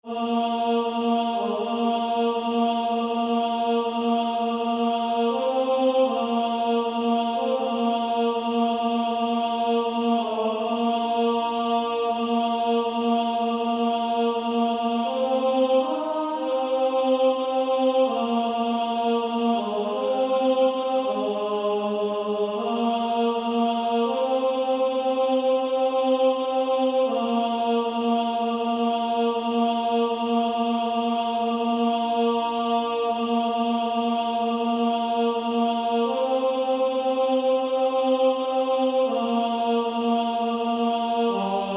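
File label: Female Choir, alto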